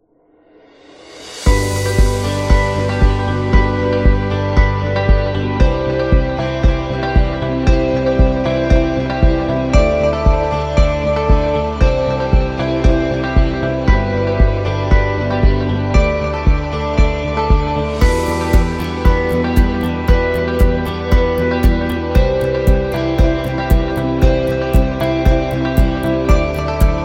Corporate Clouds; corporate music vol 1

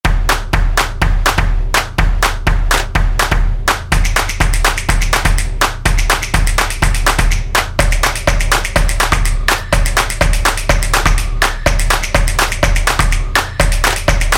Stomp Intro

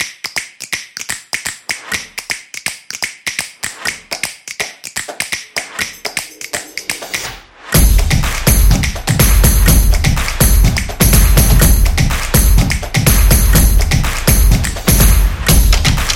Clap and Drum

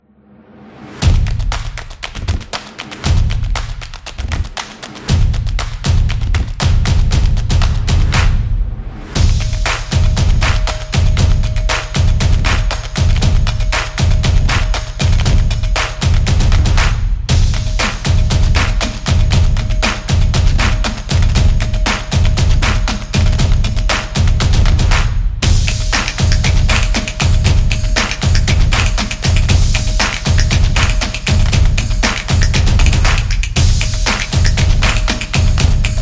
The Drum

